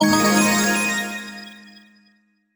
Slot Win

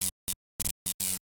Electric Particles; Caffiend; Going Under

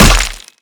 Destructable Stone Take Hit; Destructable SFX Stone TakeHit 02

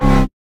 Boss Core Hurt; Avie

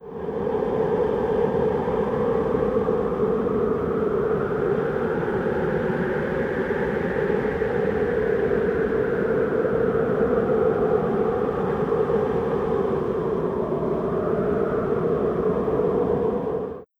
Sierr Mountain gust

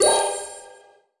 Perk Activation; Subway Surfers City Sound Effects; Android

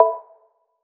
Result Button

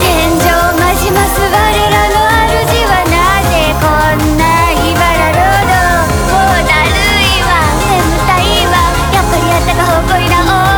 BGM Reach

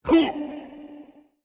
Effort Small

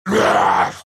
Monster Yell

